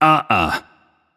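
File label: nonverbal disagree; voicepack; smite; merlin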